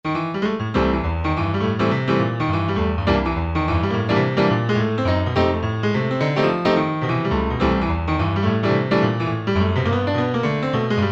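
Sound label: Bright Classic, Alesis ZA Stereo Jazz Piano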